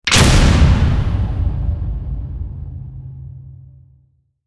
hit big

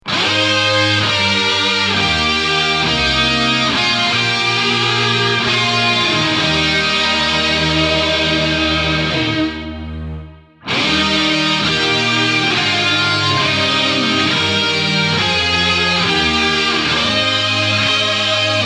welsh national anthem

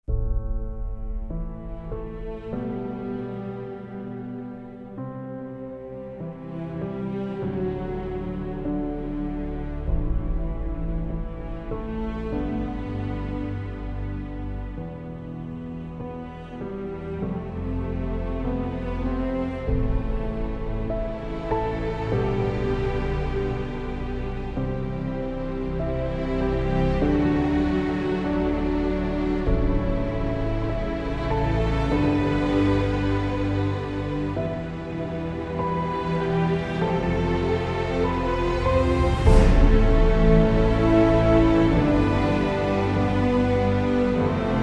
no choir

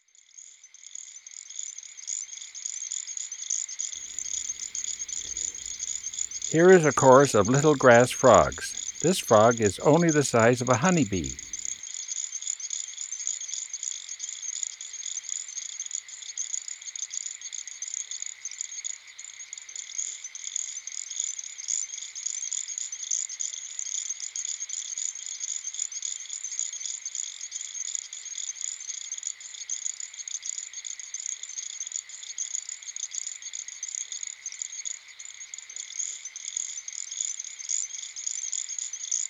little grass frog; dan gibsons solitudes

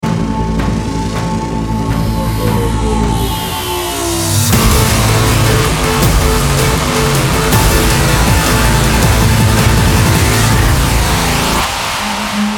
no choir

